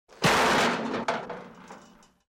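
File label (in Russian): мягкий удар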